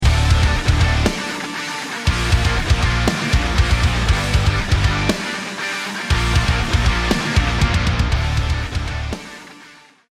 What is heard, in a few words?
won round